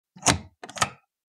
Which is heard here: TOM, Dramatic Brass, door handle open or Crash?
door handle open